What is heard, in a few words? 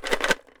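ELM RATTLE, PERC; AKAI S6000 CD-ROM - WorldSounds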